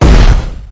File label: 8bit explosion bomb boom blast cannon retro old school classic cartoon